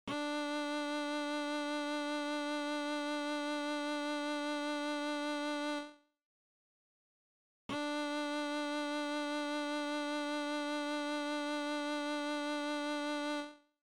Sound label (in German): Stimmton D, violaschule